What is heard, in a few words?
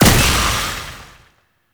Pistol Projectile Embed; Weapons; Warframe; Tenno; Temple Signature